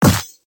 step cowboy

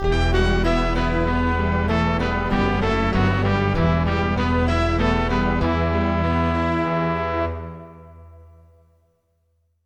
GAME OVER (CM-64 version)

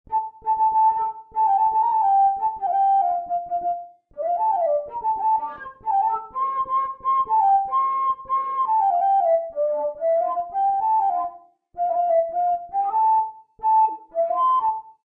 Flute

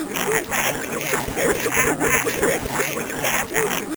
Gibbering

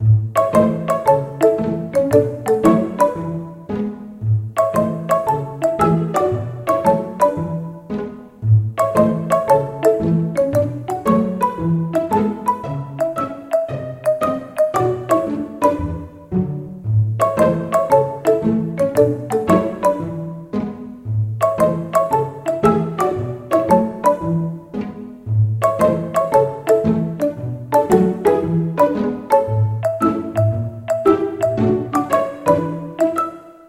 Cutscene BGM; Cookie Run Kingdom

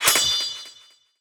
Button

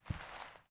Walk; portal quest android; Magic Shrek

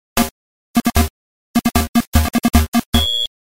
Start Music; Sky Kid; arcade

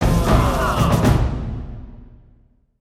Level Fail; flockers

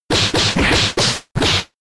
Thrash; attack moves; Pokemon; Gen 5; Blk Wht Blk2 Wht2

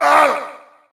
Samurai pain; Fight Knight

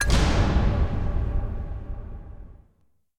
UI game start; beast quest; ps4

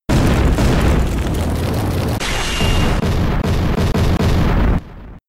Eruption

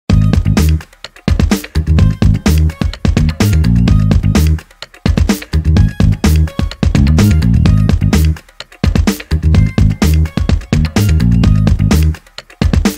Minigame- Bomb Defusing (Slow); Trial Forest; Grand Chase

